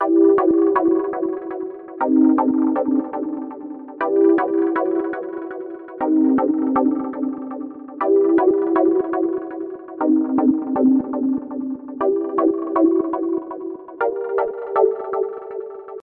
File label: Alpha Synth Chorus Chords Synth, 120